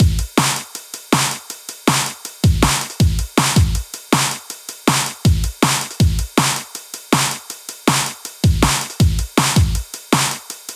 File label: 160 Clap Beat